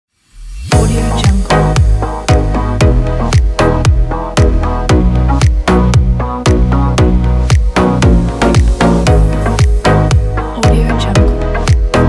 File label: uplifting pop